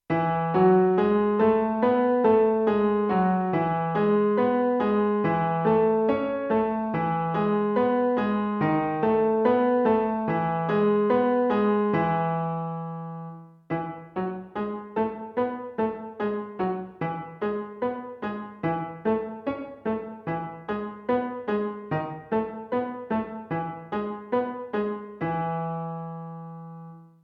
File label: e major